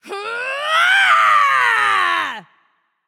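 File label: battlecry, female voice; smite; voicepack; hera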